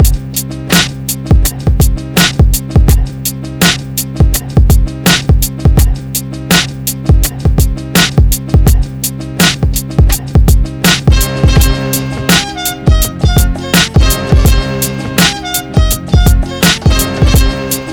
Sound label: beats